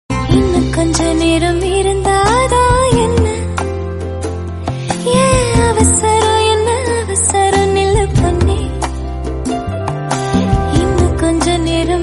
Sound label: tamil ringtone